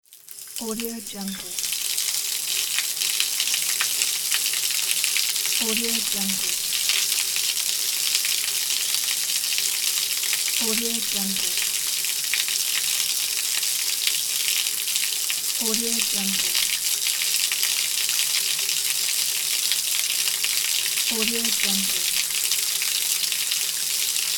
tap water; mediastockmusic

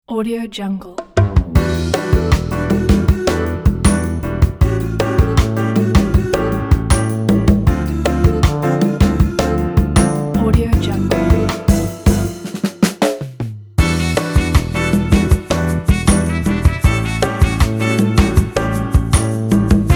elevator bossa nova; mix-o

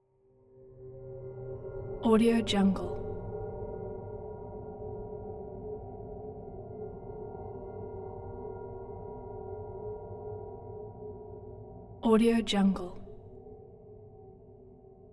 bell drone constant deep resonant ringing hum

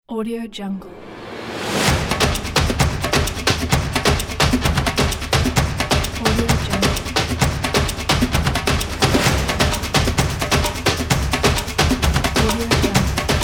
a chase ident

